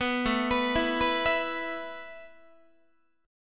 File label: A Suspended 2nd A Guitar; Asus2 arpeggiated